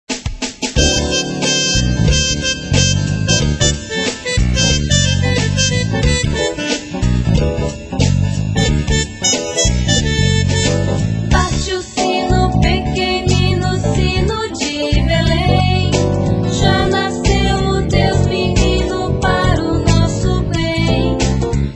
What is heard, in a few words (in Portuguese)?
bate o sino